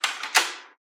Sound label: printer opening closing; soundscrate